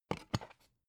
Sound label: dvd case remove dvd